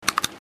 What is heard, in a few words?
mouse